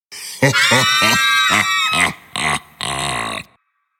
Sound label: Sinister evil male laugh followed by female screams; Evil Laugh (With Screams); Evil Laughs (Sound Effects:Horror)